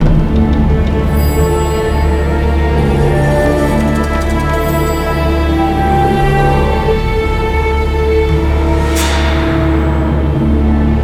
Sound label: Siege Field Melody